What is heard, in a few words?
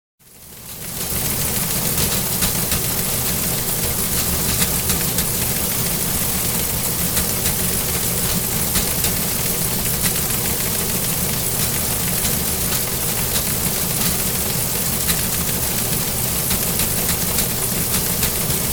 Teletypes; SOUND EFFECTS1